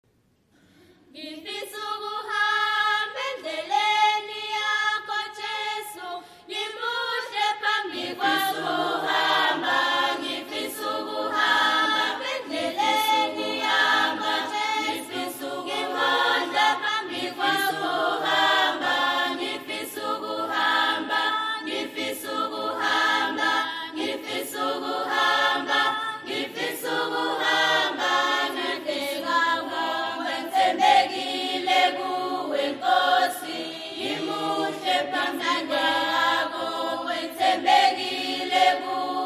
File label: choir; weenen choir